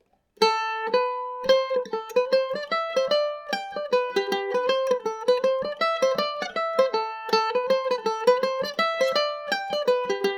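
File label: A dorian, Irish mandolin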